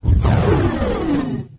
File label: nitro; There; ThereClient